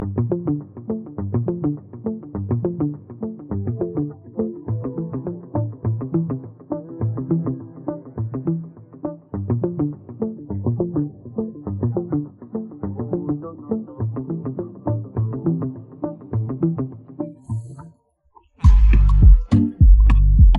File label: Instrumental